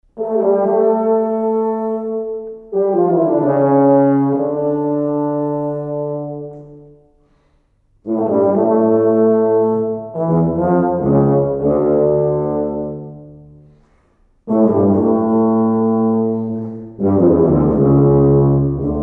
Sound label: toccata fugue d minor, brass